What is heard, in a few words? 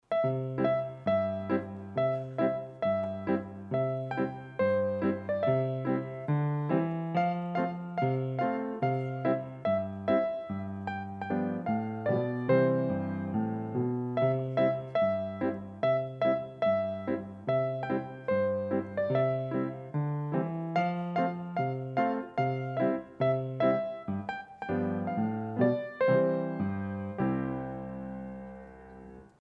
Gospel Bells